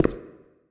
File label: cave grounds step; Original TK Sounds